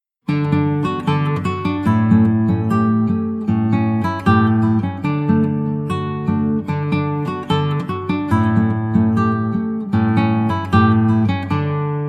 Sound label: samba lele